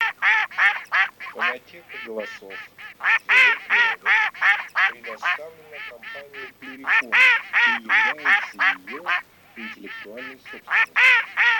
Кряква Germano

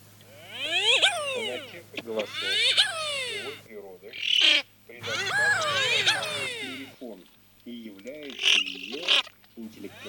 Нырок Красноголовый Moriglione

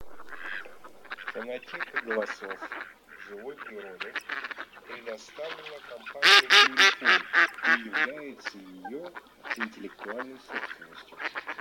Кряква Germano

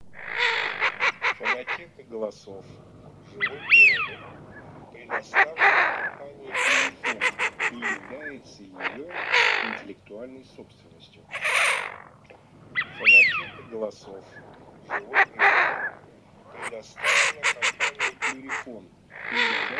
Свиязь Fischione

